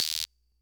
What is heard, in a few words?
Guiro Short, sr-95; classic machines